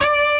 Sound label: orchestra string hit D